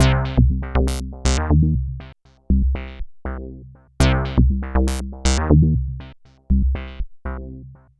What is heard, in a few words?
Bassline, Prototechno